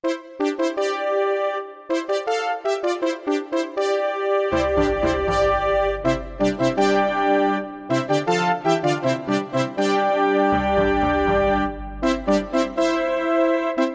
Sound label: Fanfare; Ultima VII The black gate and The Serpent Isle